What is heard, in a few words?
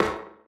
pipe step; Golden Crayon Sounds